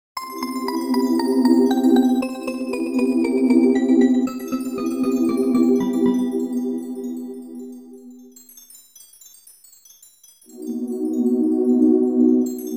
BGM Ambient; 1996 hudson game music complete works 1996

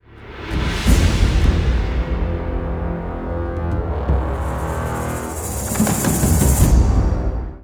music jingle tension; Jack Keane 2 The Fire Within; Windows gamerip 2012; shanghai prison

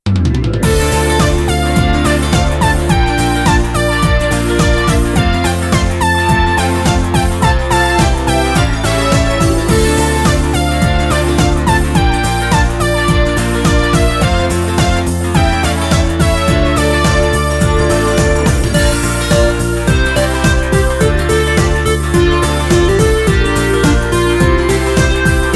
Music Menu event

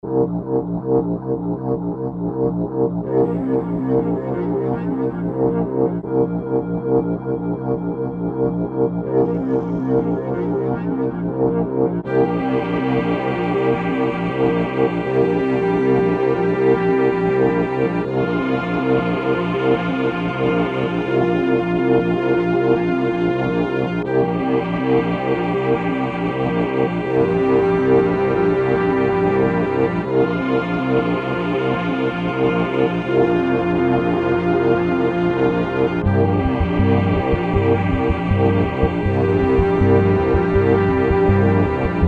Theme Oboe Bridge; God of Blades; android ios macos mobile windows

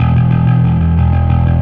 Fuzz E1

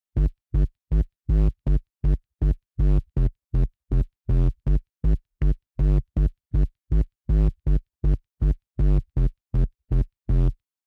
Bassline; chippy

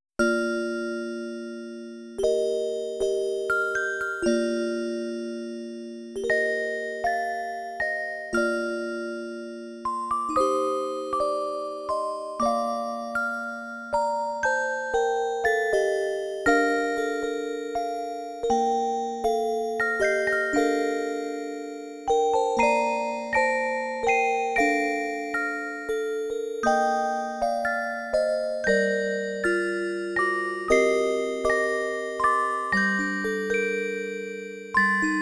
MusicBox; My Merry May Sound Collection; MISAO

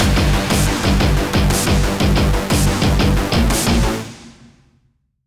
Action Event Industrial Boss

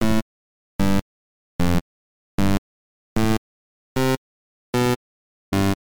8bit; Shooting Love 200X Xbox One gamerip 2009

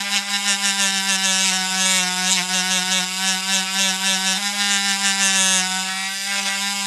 Bee Flying Sound; Jolly Battle

